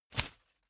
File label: whoosh paper scrap flutter